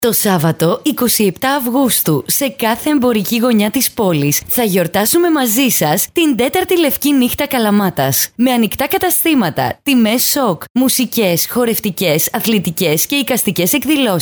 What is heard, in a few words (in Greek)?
VOCAL; ΛΕΥΚΗ ΝΥΧΤΑ 2016